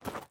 pas; deadmaze; marche

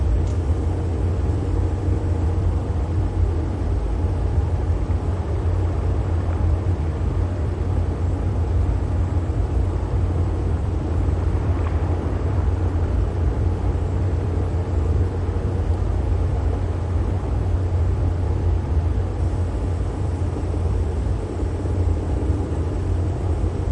voiture; deadmaze; cinematique

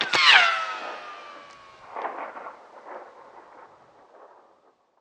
tir proche; fortoresse

